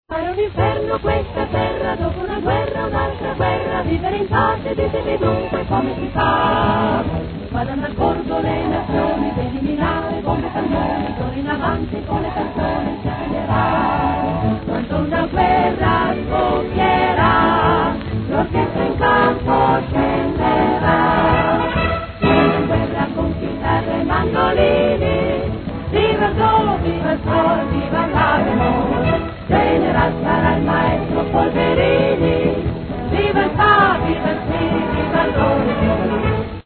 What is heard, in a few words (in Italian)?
QUARTETTO